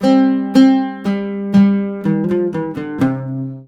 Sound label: LUTH G, Arabian Traditions, LUTH RIFF; USB Soundscan vol.43 - ArabianTraditions; AKAI